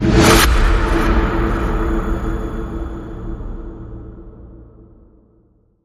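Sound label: Hit Swoosh; Rapid Response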